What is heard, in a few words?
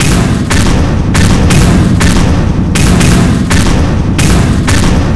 buzzer beating fast; svencoop; sence; mistake coop